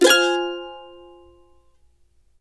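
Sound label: CEE CHORDS F minor, Blues Guitar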